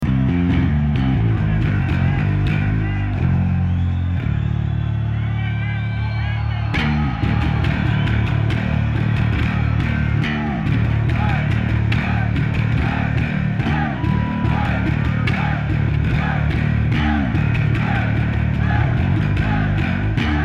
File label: Bass Solo